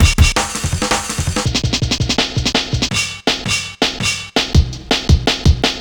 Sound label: Drum Loops, Jungle, 165; Combination A